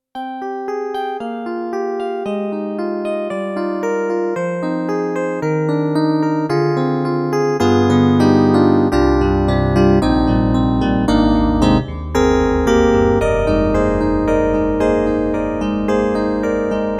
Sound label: YAMAHA MU90 Electric Piano; Electric Piano 2 KSP